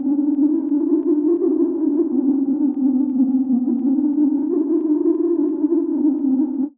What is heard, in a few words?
ALARM